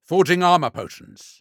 Forging Armor Potions; Realm Royale; Assassin; Sly Scoundrel

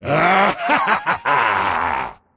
dismember laugh; Pudge

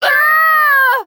Falling; paladins; Kinessa

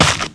Dire creep footstep

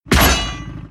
piston impaler quill spray

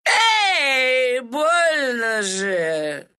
death, ru; Meepo; Dota 2

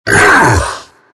morph grunt; Dota 2; terrorblade

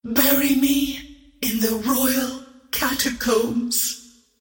death; Dota 2; queenofpain; arc

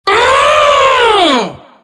grunt; Legion Commander

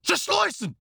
Grunt Attack; Khan

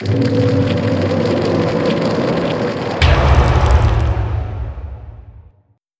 Ink Swell

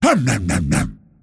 nom nom; pudge

